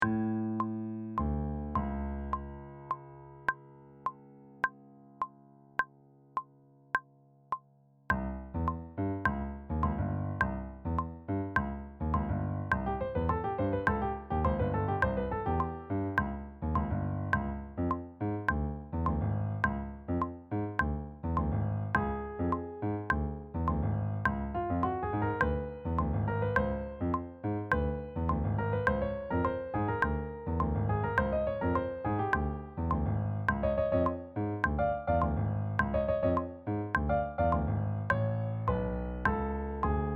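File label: cuban at tempo trumpet 1 and bc; band resources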